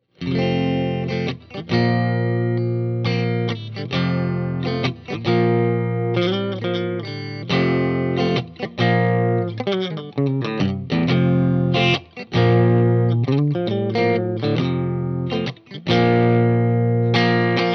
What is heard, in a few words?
TRIWATT Tele Middle Fanes; trinity